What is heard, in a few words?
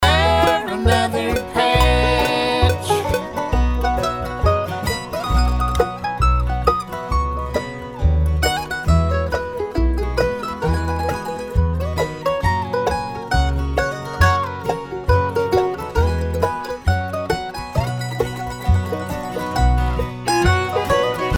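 mandolin solo three quarter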